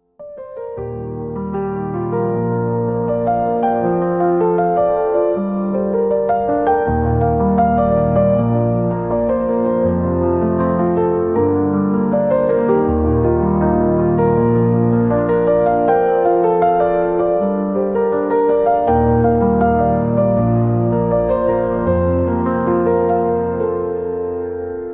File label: Piano; PianoCat